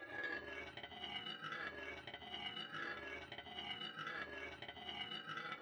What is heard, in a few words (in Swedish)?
Snurrskiva Ljud; Granny 3; Android